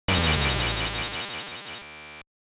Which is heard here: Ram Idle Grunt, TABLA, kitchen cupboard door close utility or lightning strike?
lightning strike